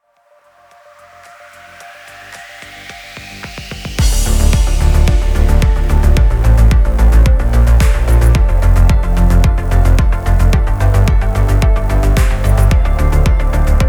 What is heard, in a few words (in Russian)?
Tantsevalnaya Trance